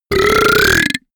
monster burp; comedy-cartoon; soundpool

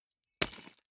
key ring large drop carpet medium; objekte; schluessel

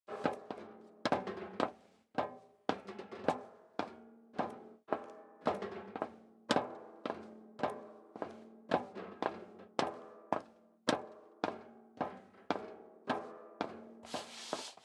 step leather shoes metal rattle upstairs sequence

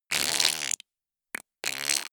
creature quack long; kreaturen

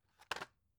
drug package drop deep soft; berufe; arzt

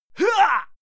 male fight attack hit; soundpool

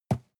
cardboard box medium grab hard; objekte

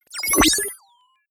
confirm sweep, signale; soundpool

